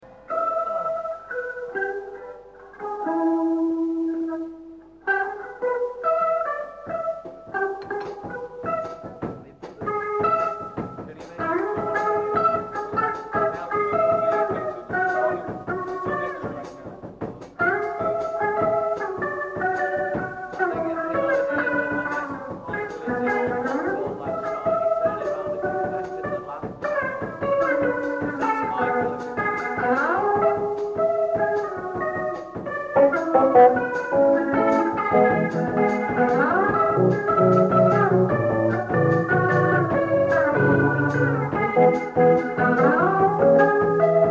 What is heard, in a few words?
(instrumental jam)